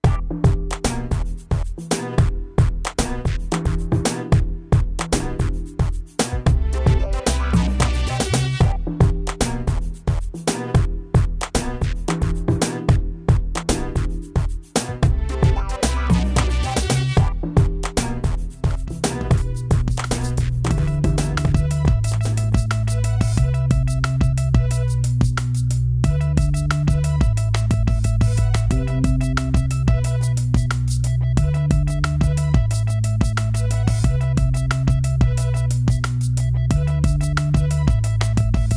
beat sampler

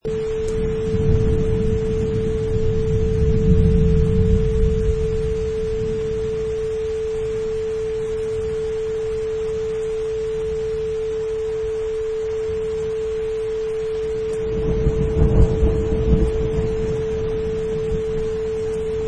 Thunder Storm

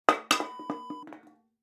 beer can drop

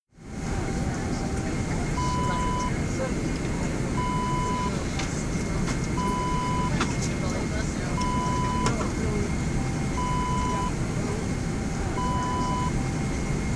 aircraft interior

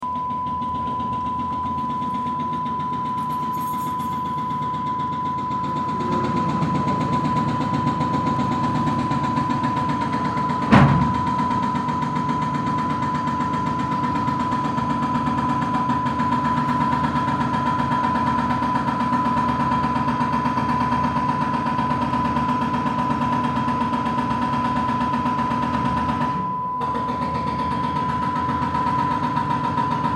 Roadworks

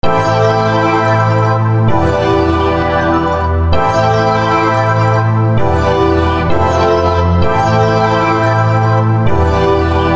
RNB PAD CHORDZ

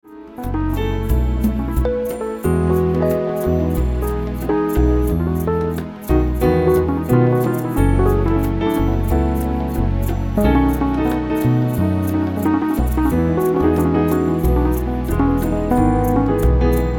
Royalty Free Music

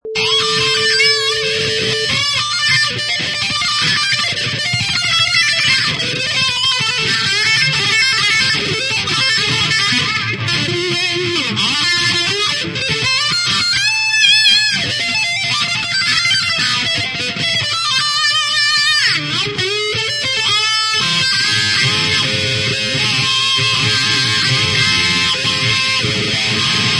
Distorted Guitar Solo 87 bpm in Cm Loop

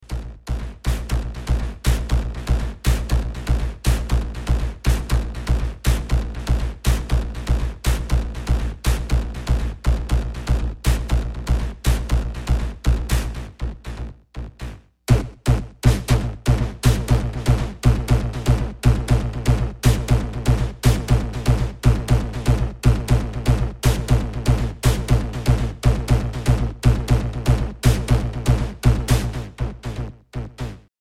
soca reggae

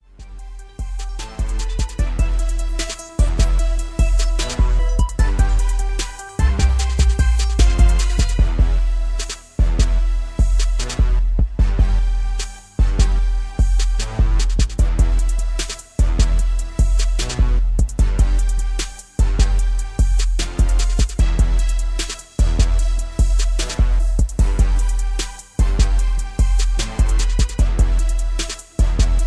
75BPM

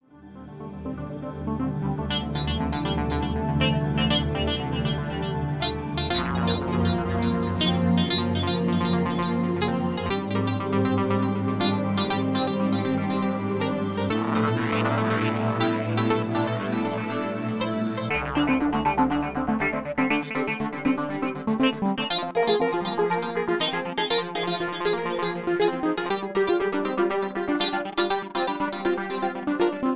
Chill Ambient

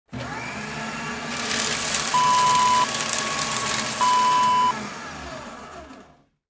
paper shredder

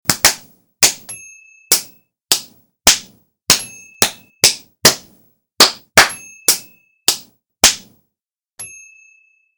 slap fight

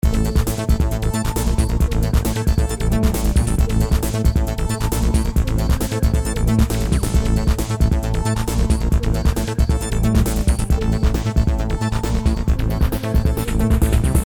MC-303, bpm 135

